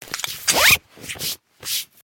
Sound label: zippers and straps